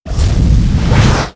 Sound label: wave crash hit; Modern Warfare